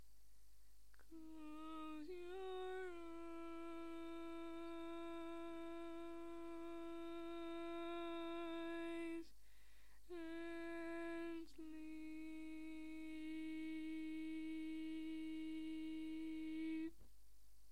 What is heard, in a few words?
5 parts Tenor, barbershop tags